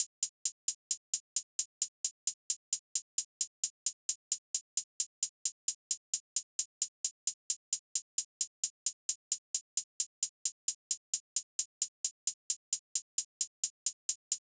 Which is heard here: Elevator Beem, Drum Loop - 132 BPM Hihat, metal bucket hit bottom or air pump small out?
Drum Loop - 132 BPM Hihat